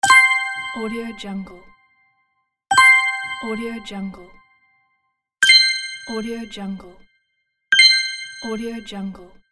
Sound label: game button confirm